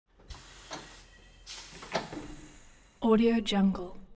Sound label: construction garage tire changing machine hydraulic separator; unsteady